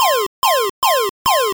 Future Sight

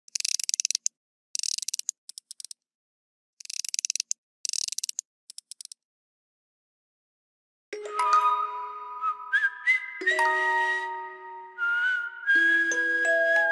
Music Box Whistle; Bayonetta Origins Cereza and the Lost Demon

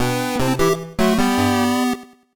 Success; collateral thinking wii u gamerip 2016

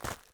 FOOTSTEP Trainers Gravel Compact Run; mono; Don't Be Afraid The First Toy

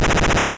Ice Beam; Pokemon; Gen 1; attack moves; RBY

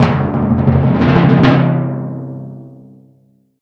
Tournament End Drums; Angry Birds

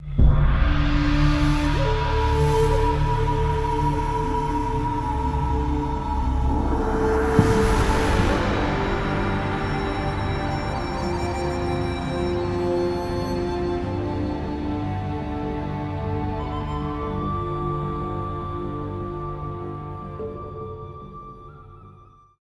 flyby china; The Sims 3; Windows

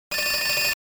alarm ringing

